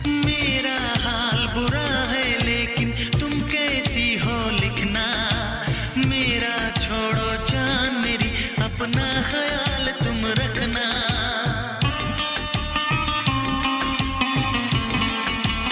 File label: Old Bollywood Song Ringtone